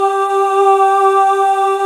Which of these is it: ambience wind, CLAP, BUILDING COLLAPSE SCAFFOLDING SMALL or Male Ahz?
Male Ahz